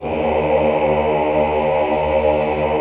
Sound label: aaahh; Sound & Vision - Gigapack I CD 2 (Roland)